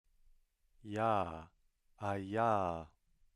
voiced palatal approximant

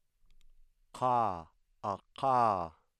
voiceless uvular stop

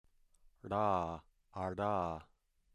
voiced retroflex flap

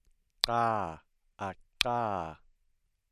palatoalveolar click

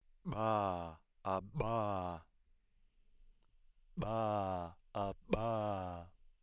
voiced bilabial implosive

